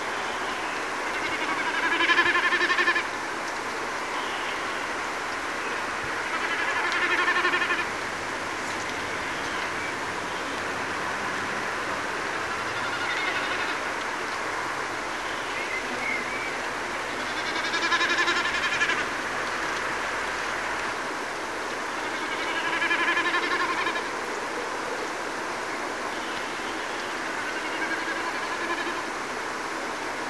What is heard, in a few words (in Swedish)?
Enkelbeckasin